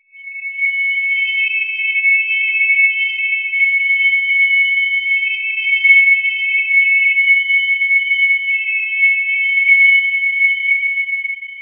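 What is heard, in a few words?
glassy ambient crystalline, atmospheric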